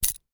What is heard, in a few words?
keys